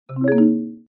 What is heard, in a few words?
cartoon confusion riff marimba; zapsplat